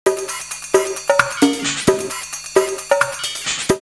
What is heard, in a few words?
R8 Soft Intro 132, drumloops, DrumMachines; X-Static Goldmine